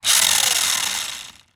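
toy remote control car motor drive x1 short burst wheels spin air upside down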